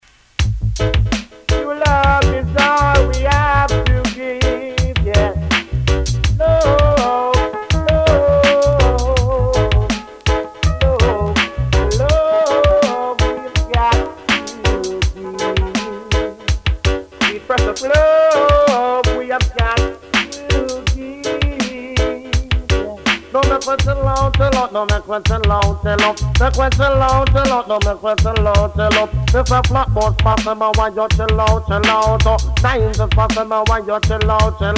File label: chill out tenor saw